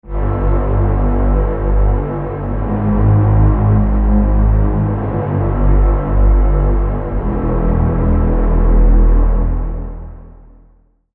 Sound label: Pad; Underground Factory; Routed Sound; Vital Expansion - Noble Gangster Vol.1